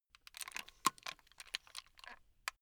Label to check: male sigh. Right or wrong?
Wrong